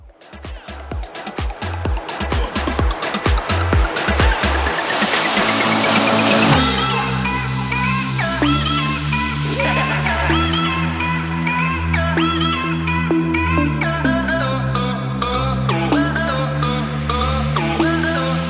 Big Room Bootleg 128

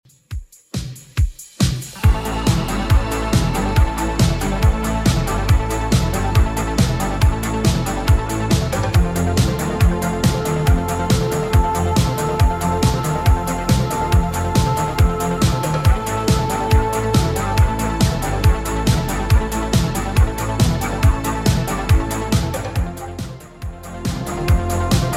80s Redrum, 139 bpm

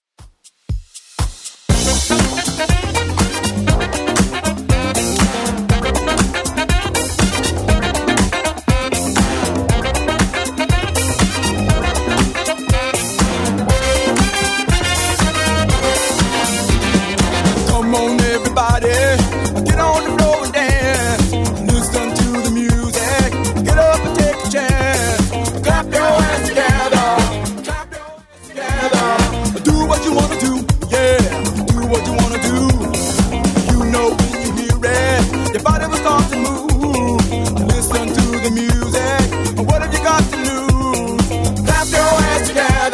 70s Redrum 120 bpm CLEAN

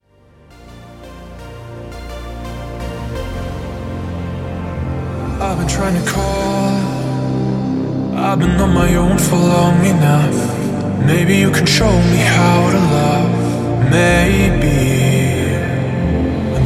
Drum Bass Intro; Remixfreakz

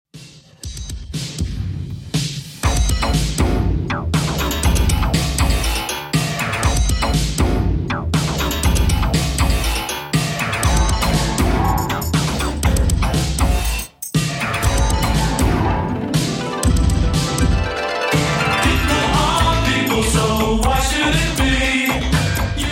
80s Redrum, 120 bpm

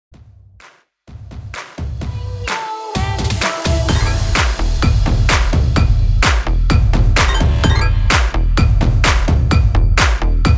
Dancehall 128 BPM

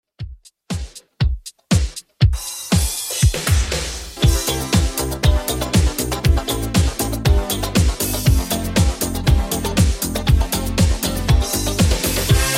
80s Redrum 119 bpm

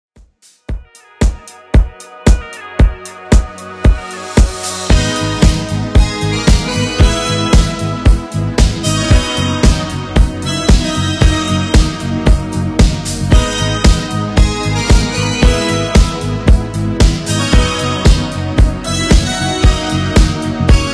80s Redrum 114bpm

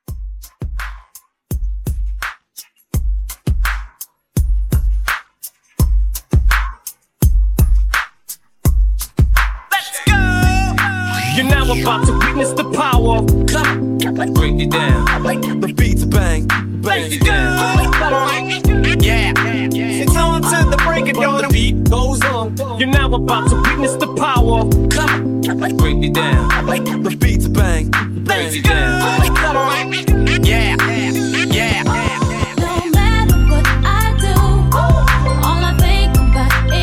Throwback Hype

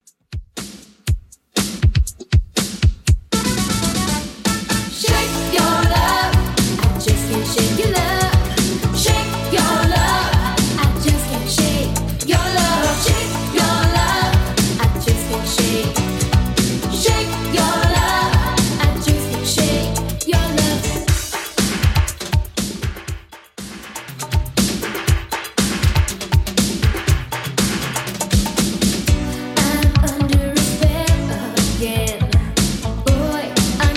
80s Redrum 120bpm

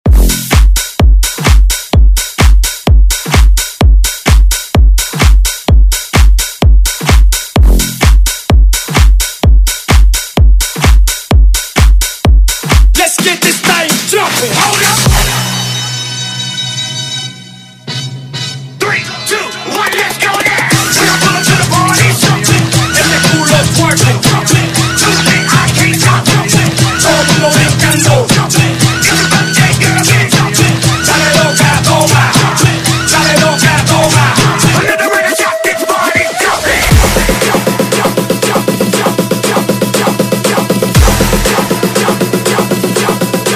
Remix Dance Redrum

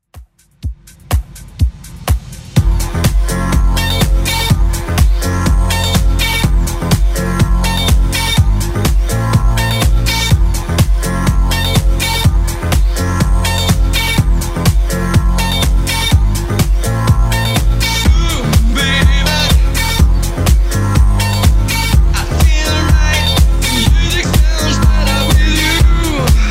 124bpm

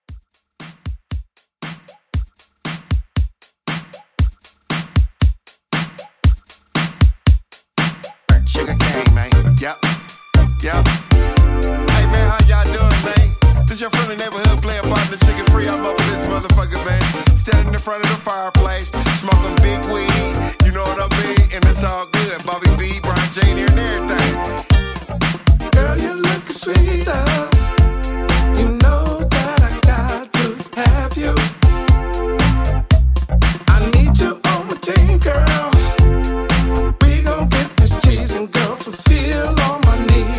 DJ Intro Outro, 117; DJ Intro Outro Edit

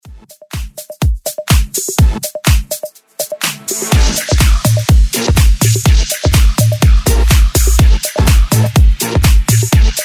House Dancemix 124bpm

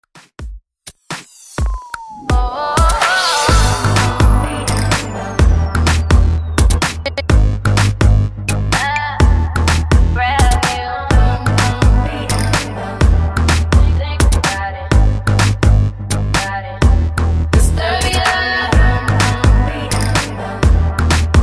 Re Drum Intro, 126

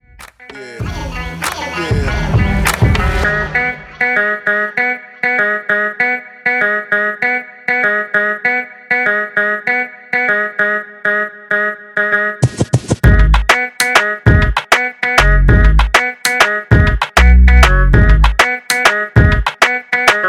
HipHop Tone Extended 98 BPM

